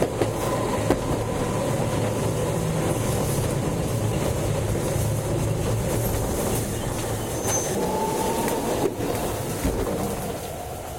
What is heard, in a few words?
streetcar middle stop; tetsuon